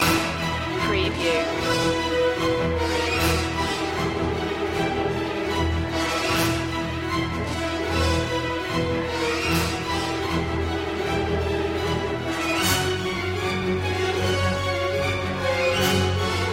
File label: war of attrition no choir no perc